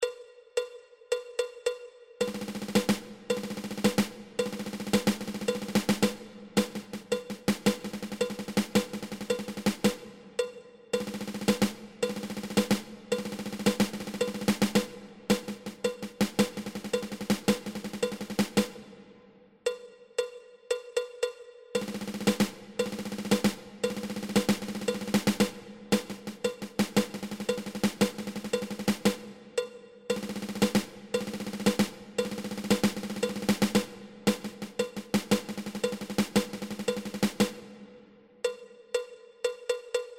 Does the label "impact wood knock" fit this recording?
No